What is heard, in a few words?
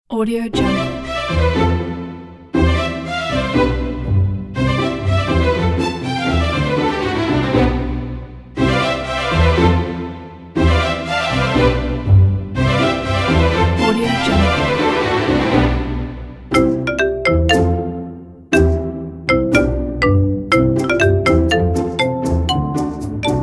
happy children pack